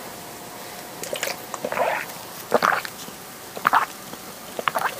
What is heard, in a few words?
drinking Water